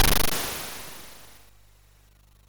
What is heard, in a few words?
sid MOS6581R3; Timezone